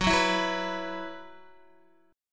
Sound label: Gb 7th Suspended 4th Gb down Guitar, chord; Gb7sus4 strummed